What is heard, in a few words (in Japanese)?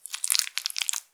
ゆっくり 1 ストローク, ピストン運動; １ストローク単発